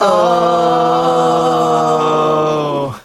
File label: "oh", group of men; ooh ahh